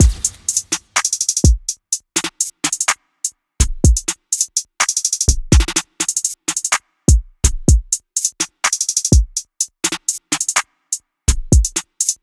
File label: DJ Drum Loops